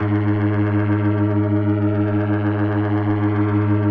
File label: B3, First Call Vintage Keyboards